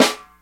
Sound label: nice steel snare drum C sharp key, Loudest frequency: 2112